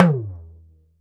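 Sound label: Talking Drum down; Drums&Percussion; E-MU Producer Series Vol. 7 – Old World Instruments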